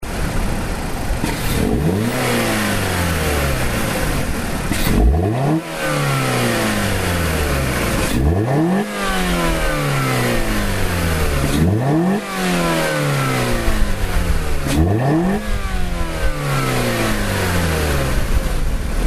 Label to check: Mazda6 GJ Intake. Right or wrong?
Right